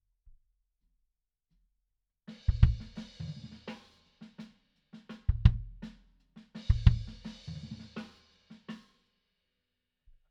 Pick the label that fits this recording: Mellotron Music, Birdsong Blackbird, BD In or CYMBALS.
BD In